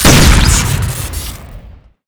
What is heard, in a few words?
deagle shoot crit, weapons; tf2classic